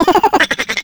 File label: skelly small